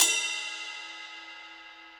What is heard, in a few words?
Ride Bell Session Dry; Drums; Drum Hits; Standard Hits; Ableton; Session Drums Studio